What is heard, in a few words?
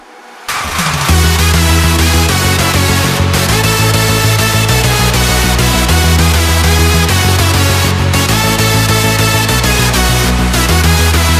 Hardstyle Remake